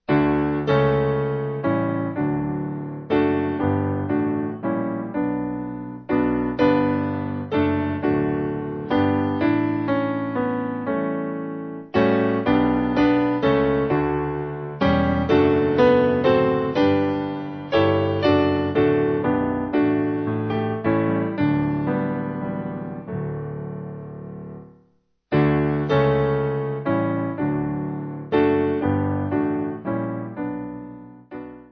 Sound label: When All You Mercies, Piano; Contemplation